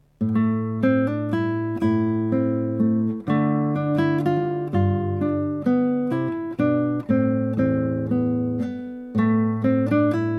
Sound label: menuet; weiss leopold silvius